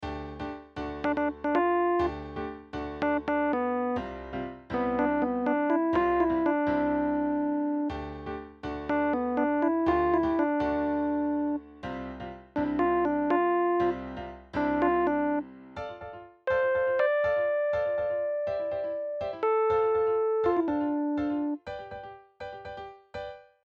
Melody

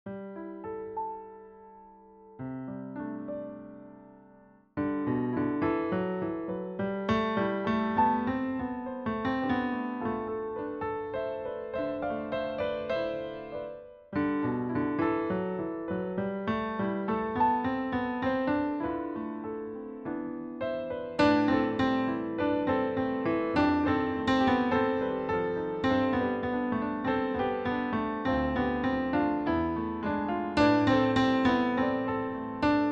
audition w melody

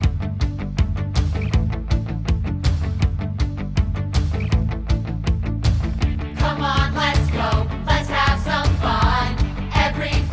Song Lets Go with vocals

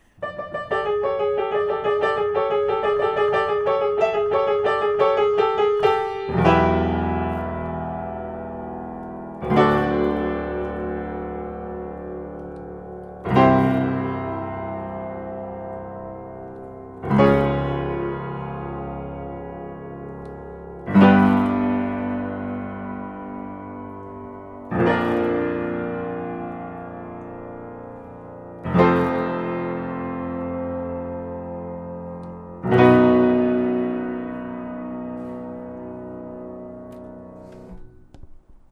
accompaniment

